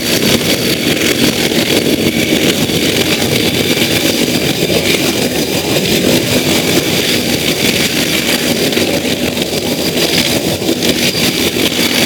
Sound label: Archwing Movement Slow Engine Loop, Vehicles; Warframe